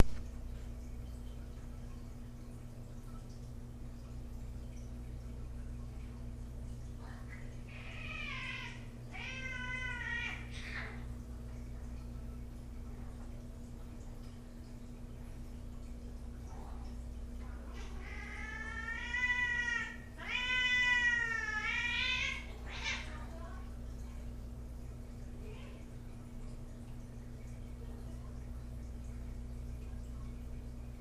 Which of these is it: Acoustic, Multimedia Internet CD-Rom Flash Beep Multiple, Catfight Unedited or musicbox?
Catfight Unedited